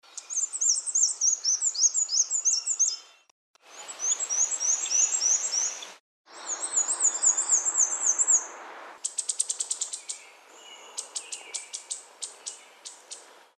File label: WARBLER BLACK AND WHITE, birds; species/birds; wbba